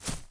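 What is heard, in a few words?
grass run right, footsteps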